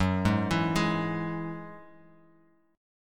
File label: F sharp 7th Suspended 2nd F sharp down; F#7sus2 arpeggiated